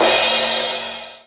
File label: Crash, Drum Machines; Drum Hits; Ableton; Factory Packs